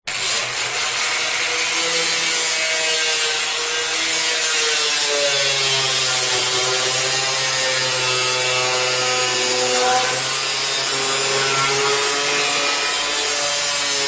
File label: industrial angle grinder med long